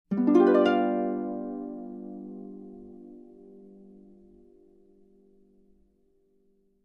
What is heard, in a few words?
Harp chord question sound effect